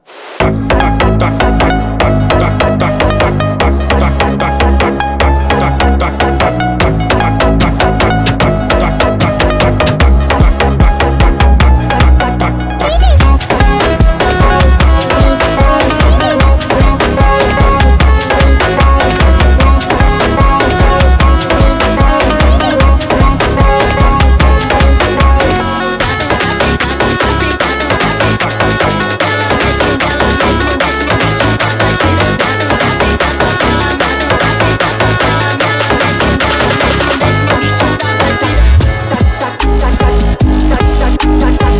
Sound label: FUNK sped up